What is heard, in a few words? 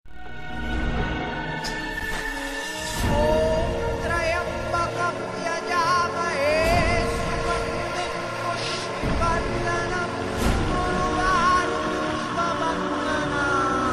horror; om tryambakam